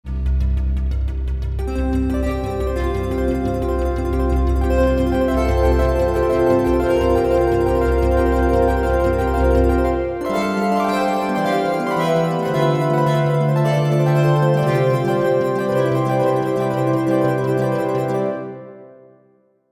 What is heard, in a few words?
harp